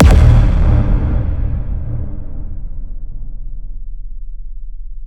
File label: Impact; Singomakers; Infected Psytrance; Impacts